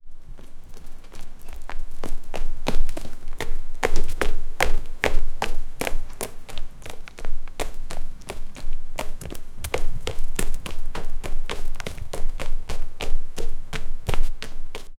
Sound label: cat running footsteps